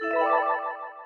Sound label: MOVE; C64 Dreams; Multi-Sound Default